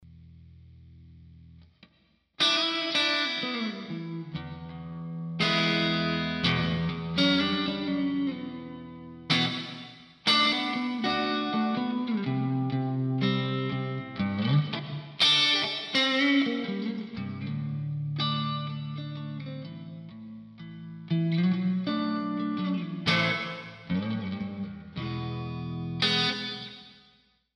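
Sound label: neck, guitar pickups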